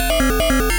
Phase Plant, bass

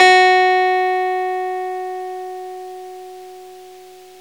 Clavinet F#4; Big Fish Audio - First Call Vintage Keyboards

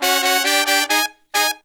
Pop & Funk Brass

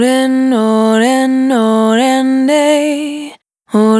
Single Voice Melodic Loops, Vocal, Dry

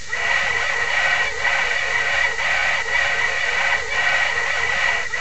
SCREAMER; Rarefaction; Poke in The Ear With A Sharp Stick

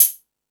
Hip Hop, Drum Machines E-MU Pro-Cussion

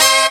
Soopa Brass Up; Mo' Phatt; Digital Sound Factory Mo' Phatt

